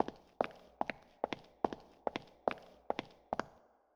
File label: footsteps